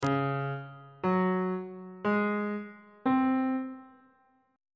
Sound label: c sharp major 7th suspended 4th chord intervals on bass clef